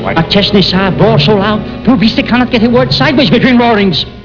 gung roar; gunga

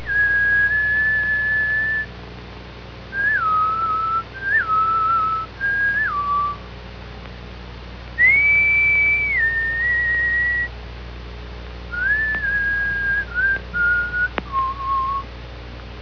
Whistling

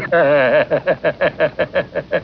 laugh; waiter

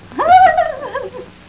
Laugh; awful truth

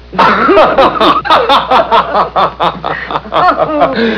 laugh

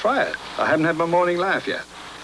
Laugh

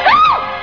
bishop scream

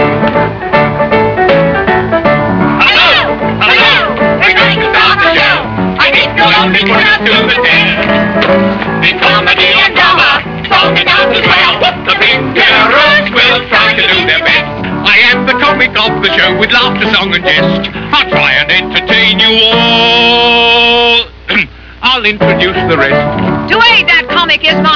Baritone; sylvia scarlett

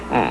Hmm

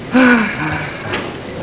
Sigh; awful truth

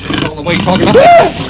baby eek

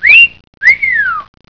houseboat whistle

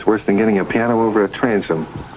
Piano; only angels

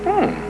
Hmmm

